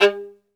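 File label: SOLO VIOLIN, Synclavier Strings; ILIO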